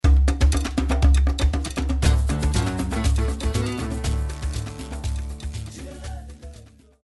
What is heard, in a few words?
happy and upbeat